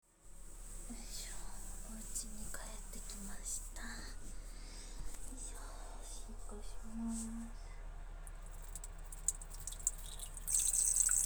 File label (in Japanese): お家のトイレでオシッコ; ４日目・帰宅後の夕方１７時の